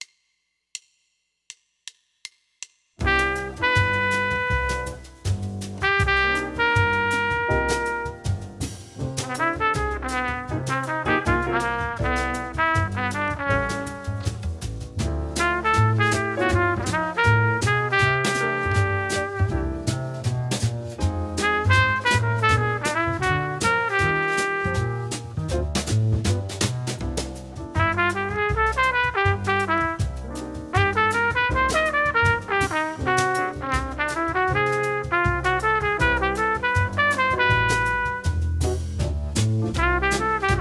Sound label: Jazz Etude; Jazz Etude #4 Trumpet and Track